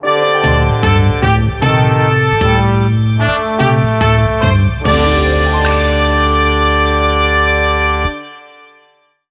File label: History Quiz Jingle